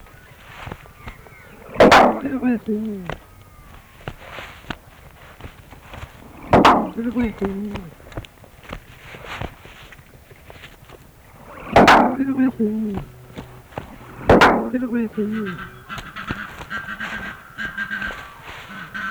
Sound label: Ripper door with mumble